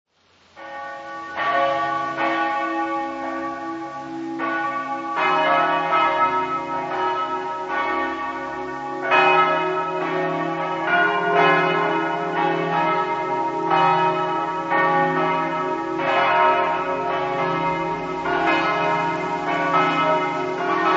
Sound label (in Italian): campane; monfalcone